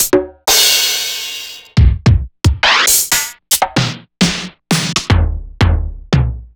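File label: Roland MV8800 drum EMX KIT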